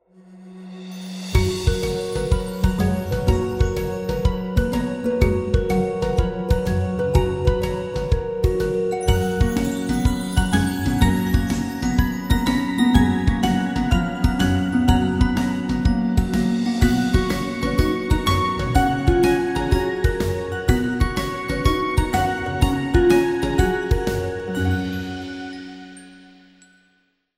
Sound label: match music; beautyfight; 時之沙閃耀變身